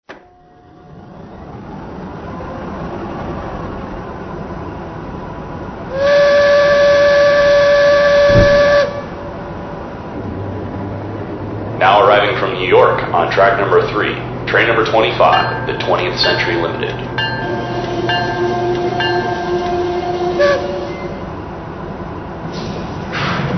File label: P2 BoxCab